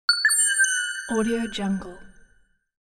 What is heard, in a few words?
soft electronic confirm accent